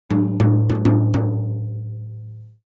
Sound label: Drums Toms B Herald, drums tom